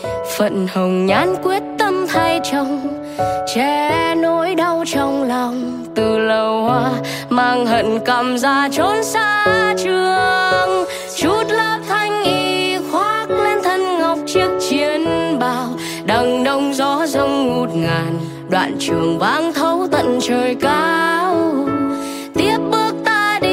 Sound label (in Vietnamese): Nhac Chuong Piano